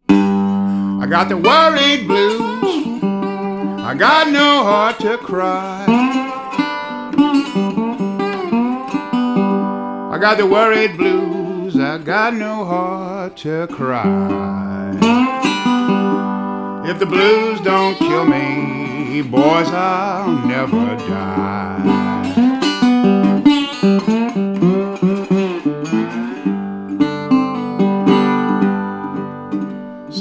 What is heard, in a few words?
Blues